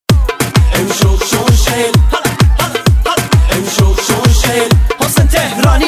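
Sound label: old wedding; arosi ghadimi dahe 60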